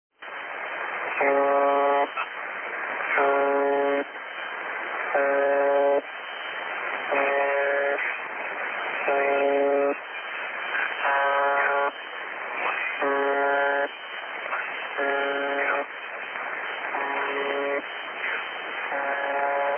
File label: UWB-76 replica, 40 meters; HamSphere